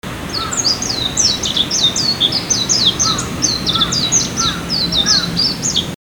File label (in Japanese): mejiro